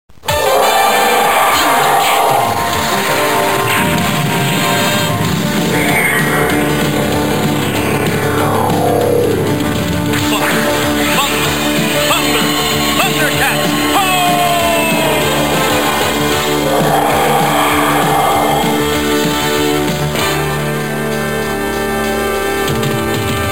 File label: children cartoon music tone ringtone; thunder cats